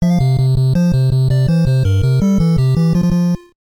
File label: Tennis You Win; animal crossing full gamerip